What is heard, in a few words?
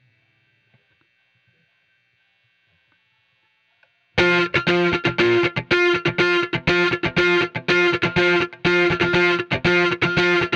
Gtr